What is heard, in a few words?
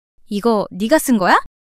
surprise